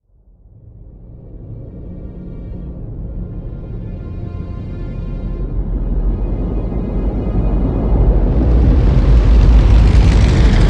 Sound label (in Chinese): 科幻宇宙飞船电子设备无损音效 Futuristic; SmartSoundFX